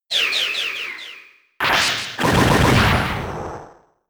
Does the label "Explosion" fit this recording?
Yes